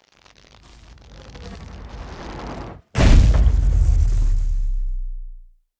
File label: heavy electric shockwave impact